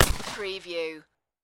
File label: Shot Gun Remington 870 12 Gauge Slug Shot Single Shot Exterior At 45ft